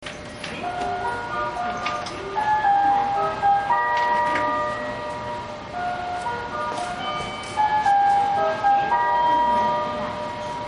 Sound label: hassya